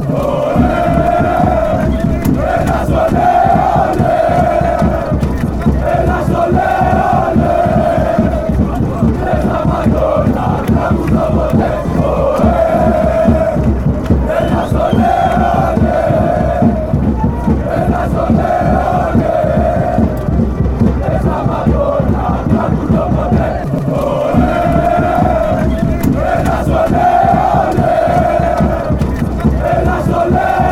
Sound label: greece chant